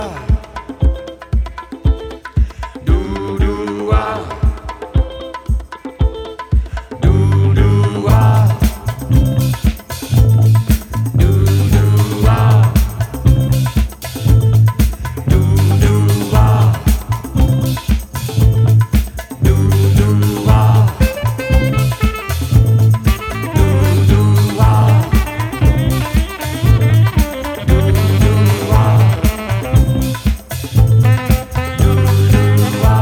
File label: Disco Remix